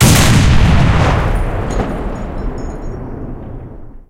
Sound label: cannon 100mm d10t shot, tanks; cannons; War Thunder